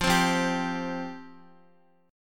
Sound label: F Suspended 2nd F Guitar; strummed; x,x,5,5,5,3; JGuitar